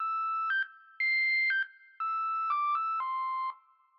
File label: organ Flute